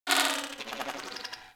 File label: Beep Stutter; Warframe; Corpus